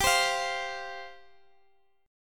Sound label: F 5th G, F5/G chord; strummed